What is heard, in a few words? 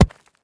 Concrete Chunk Drop; rocks & dirt